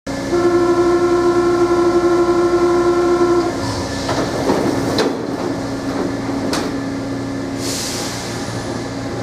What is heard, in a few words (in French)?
Signal sonore et fermeture des portes d'une rame de la ligne 3 du métro parisien